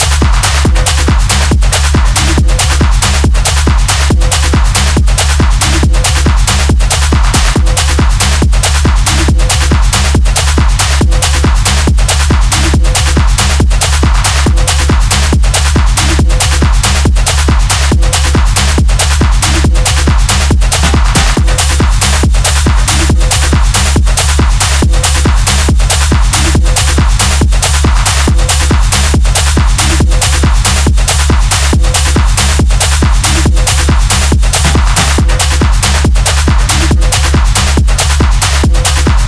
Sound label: Da Flute